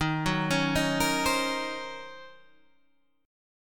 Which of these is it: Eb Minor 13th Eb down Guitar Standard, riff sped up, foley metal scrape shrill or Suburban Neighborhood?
Eb Minor 13th Eb down Guitar Standard